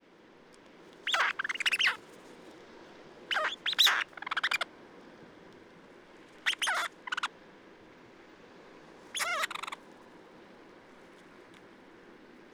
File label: distress, Ireland